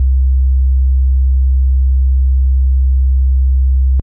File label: Clean Sine DX100 C1